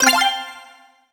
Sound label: life gain; tetrecs